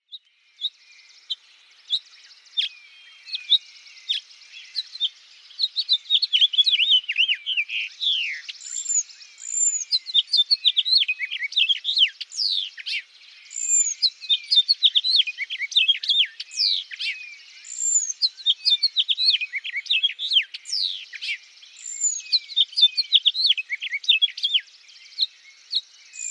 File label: House Finch; Excited courtship song, punctuated with high-pitched calls. June 18, 2006. Hatfield, Massachusetts.